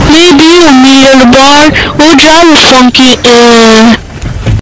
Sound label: funky ehhhh